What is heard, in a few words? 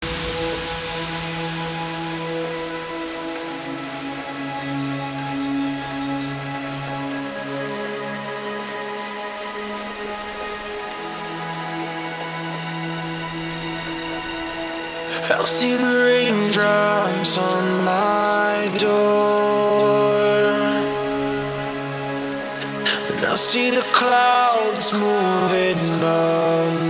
rain drums